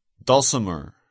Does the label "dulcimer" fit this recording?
Yes